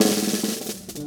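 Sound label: snare, drums; mercure drums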